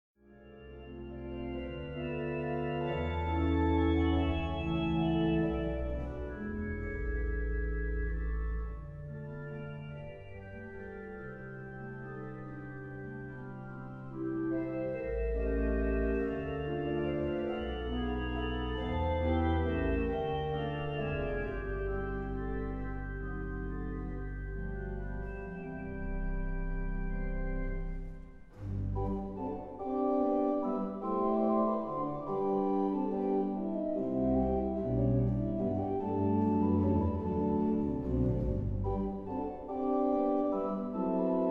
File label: Organ